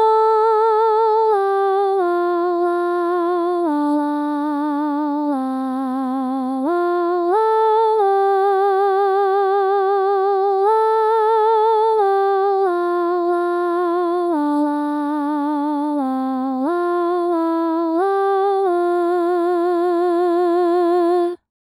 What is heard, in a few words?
Soprano